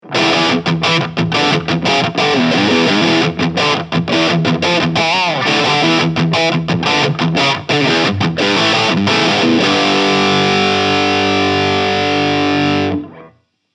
Hard Mid Gain; JHS Pedals

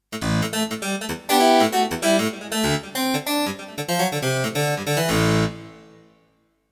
Funky Harpsichord

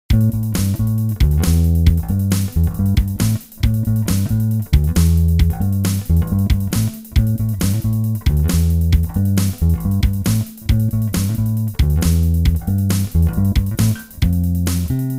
Harley Benton JB 62CC Neck Pickup only

